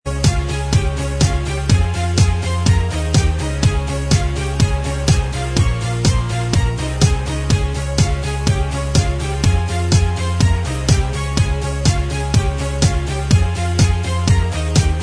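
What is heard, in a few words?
instrumental, backing tracks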